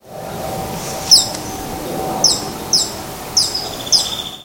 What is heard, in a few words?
Phaethornis eurynome; PE Cantareira SP